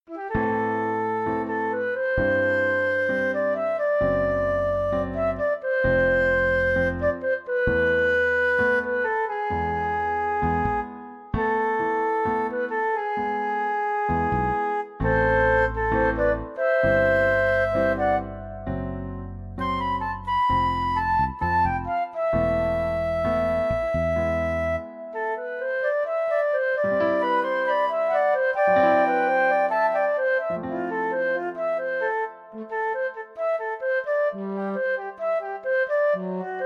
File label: Pensive Piano Suite